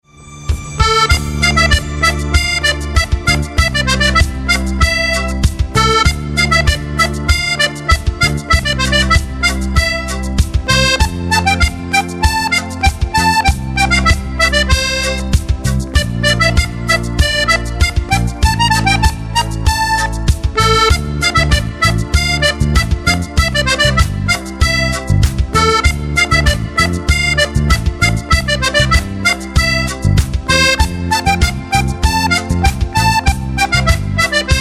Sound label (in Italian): flauto